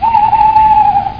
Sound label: barn owls; HORROR